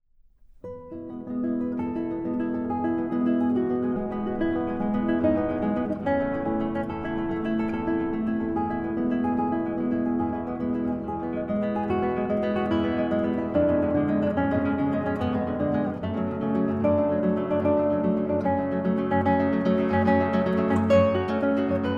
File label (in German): Gitarren Duo